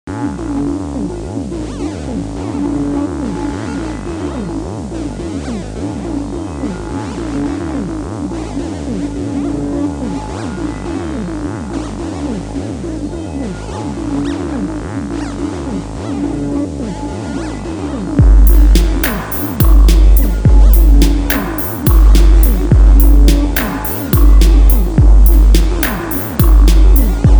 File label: Frequency Beats